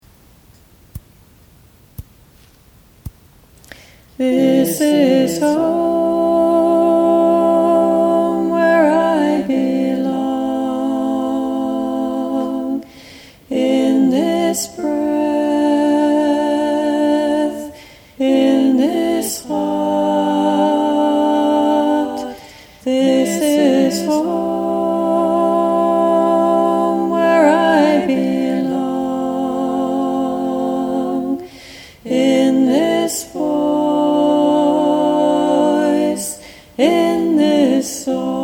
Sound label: This is Home Alto